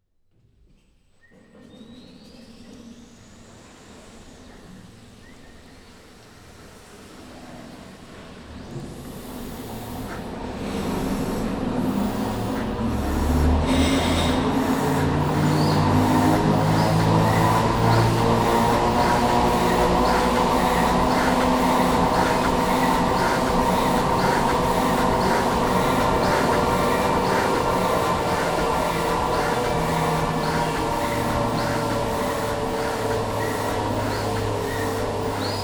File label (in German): Brikettfarbik Dampfpresse Schichtwechsel; Brikettfarbik Luise